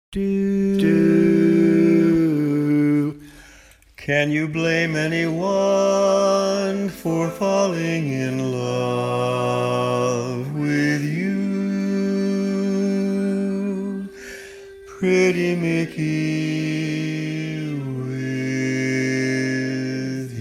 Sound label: Bass, barbershop tags